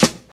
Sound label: noisy hip hop snare sample a sharp key, snare drum tuned to the A# note